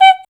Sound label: Voice Spectral F5; Best Service; Voice Spectral II